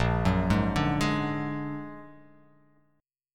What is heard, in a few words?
A sharp Augmented 7th; x,1,4,1,2,3,x,x